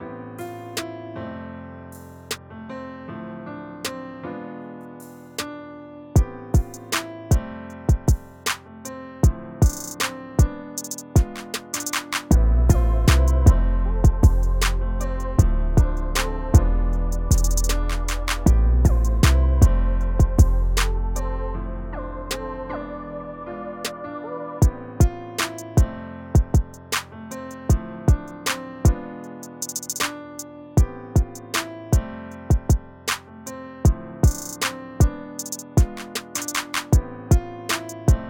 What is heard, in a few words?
beat mix